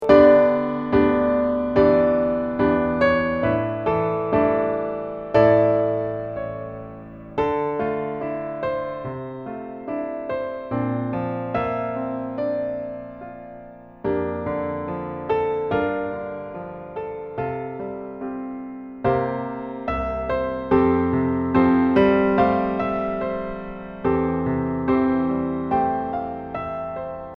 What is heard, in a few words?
accomp